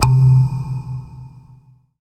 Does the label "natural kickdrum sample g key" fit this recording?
No